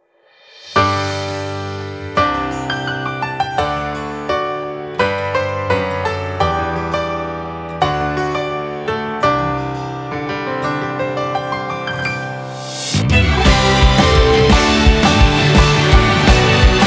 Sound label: Instrumental; Monogatari; Owarimonogatari